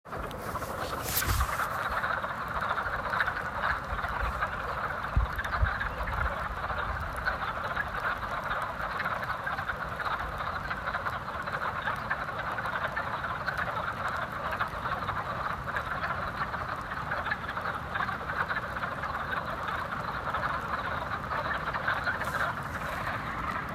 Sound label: Wood frogs; Cades cove